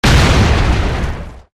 Grenade explosion

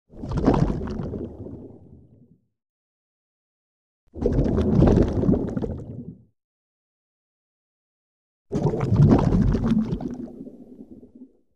Under water

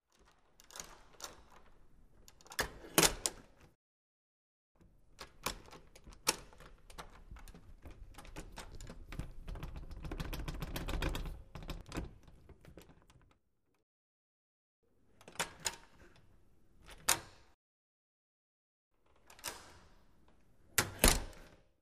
Metal door open, close

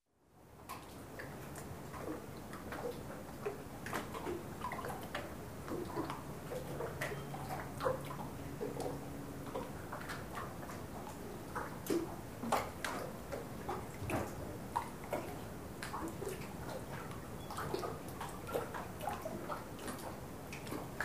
Small waves crash the boat; sea

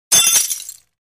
glass breaking

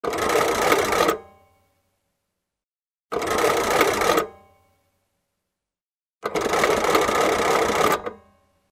Twisting the lever of a vintage telephone

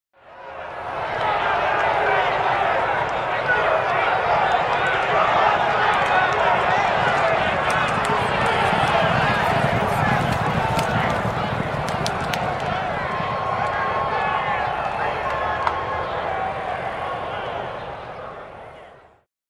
Horse racing, finish line, crowd of fans

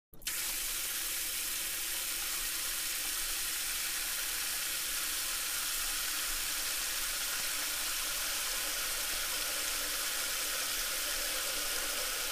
Water flows into a metal sink

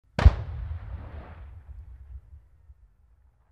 Tank shot from a distance

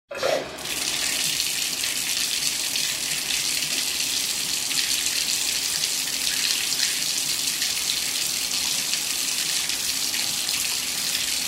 Water flows into a porcelain sink

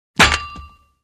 Phone is thrown on the floor; telephone

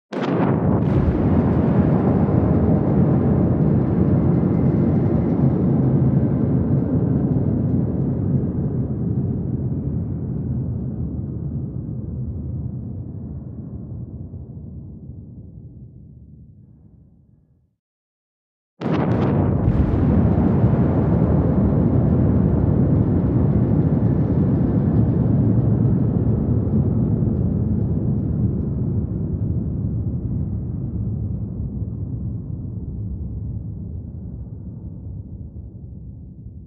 Underwater explosion; explosions

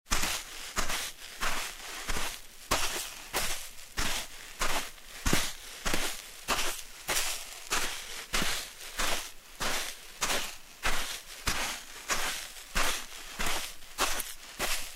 Barefoot steps on dry sand

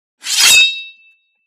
The sword is taken out of its scabbard; cold weapons

